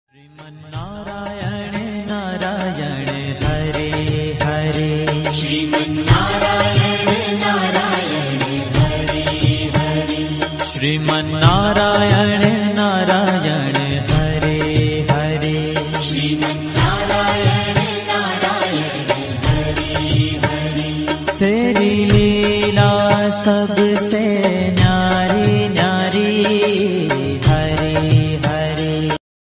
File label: bhakti ringtone; shriman narayan; lord vishnu; vishnu bhagwan